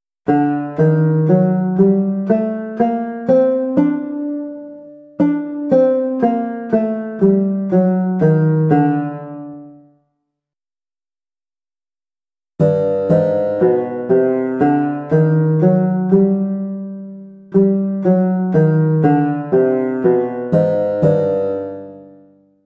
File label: hijaz